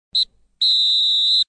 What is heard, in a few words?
whistle